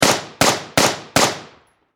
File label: Warfare Machine Gun 5.6 Caliber M4A2 Four Shots Distant Perspective